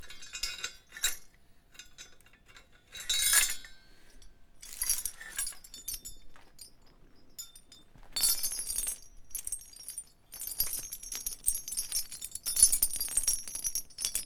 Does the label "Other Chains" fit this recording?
Yes